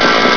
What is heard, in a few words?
saw hit, weapons; ParoxysmII